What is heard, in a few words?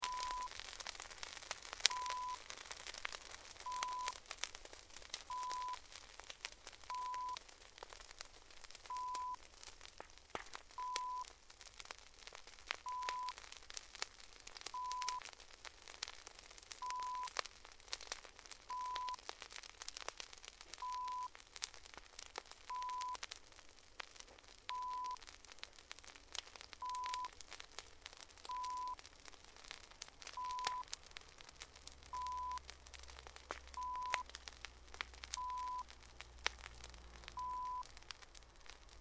Bonfire FIercely Crackl